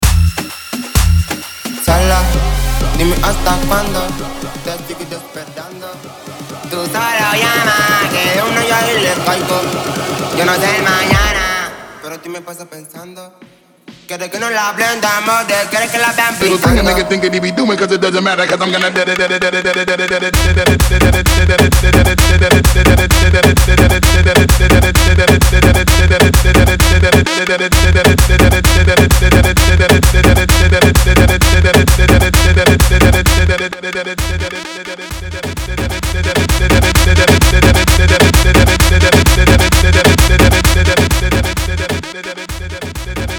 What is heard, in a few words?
Bootleg 130BPM